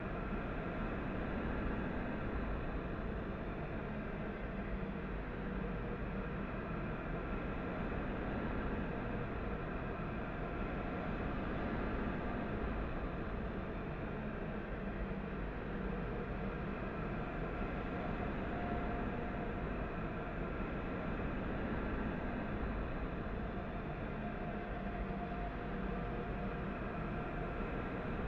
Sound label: Wind; LOOPERS